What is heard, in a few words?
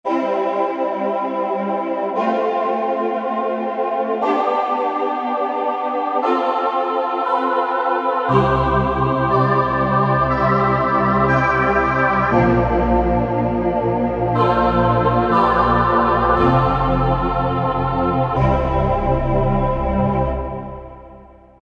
Ooh Ahh, Korg M1; Factory preset examples